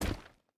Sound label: footstep rubber boots stones jump land; Rust